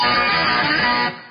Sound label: riff sped up